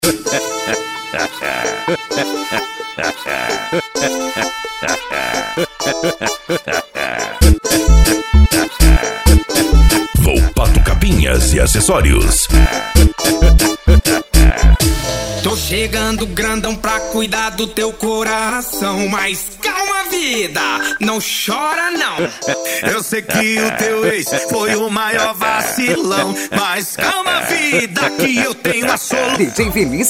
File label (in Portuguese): funk consciente e hip hop